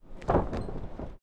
wrestling turnbuckle slaps

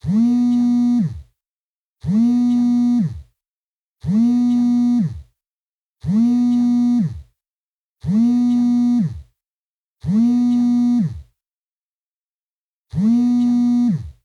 phone vibration; fx-market

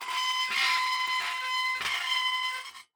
gate open

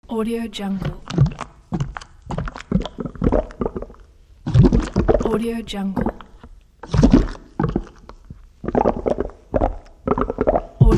pipeline cleaning

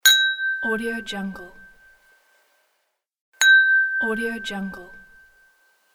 ding

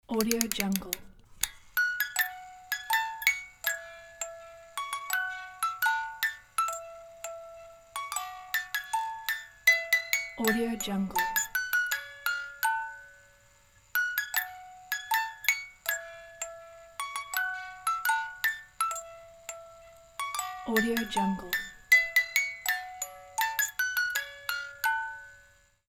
music box playing; spacelabstudio

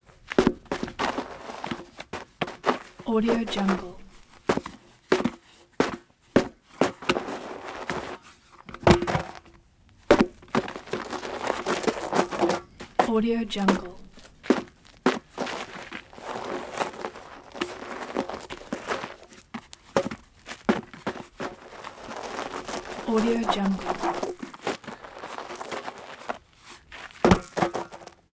tire falling

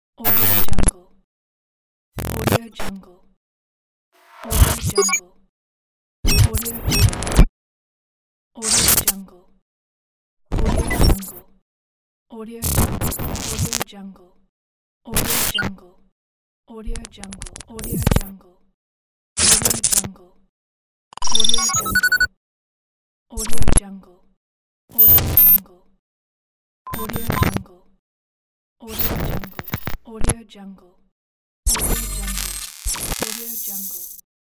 glitches

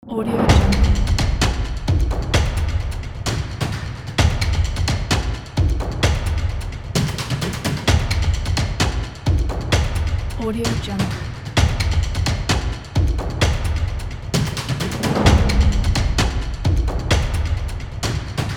cinematic epic percussion

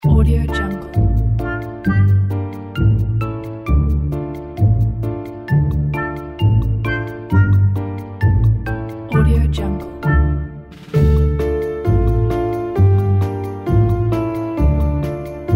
funny forest weekend

